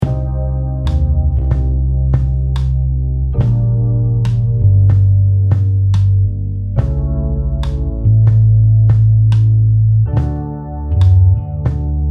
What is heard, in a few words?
lofi